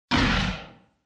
Monster

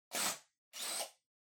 Blowout Far

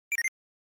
NES Shooter Score

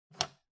Telephone Hardware Receiver Pick Up

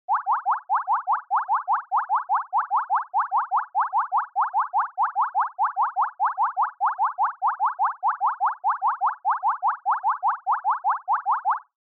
Onoma Panic Slow

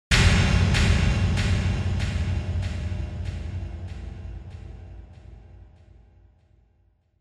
Impact, High Delay; Onoma

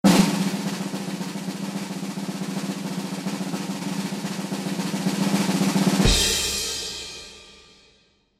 Quiz Results

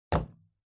Stapler, Put Down

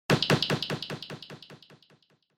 Motion Grab Delay Low

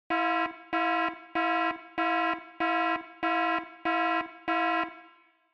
Warning Siren Fast Long

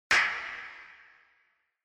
Castanets

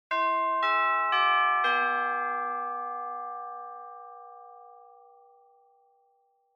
Japanese School Bell Fast Short

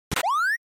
SNES RPG Jump

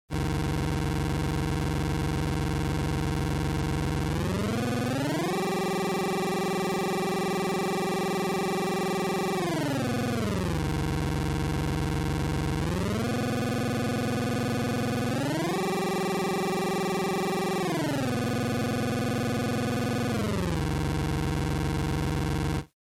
Racing Engine

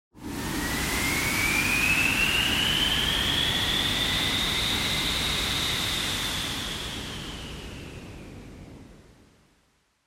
Wind Synthetic

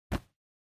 Cell Phone Hardware Put Down Comforter

